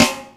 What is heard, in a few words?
XR-10; Best Service Gigapack 2